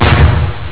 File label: explosion sm; antaris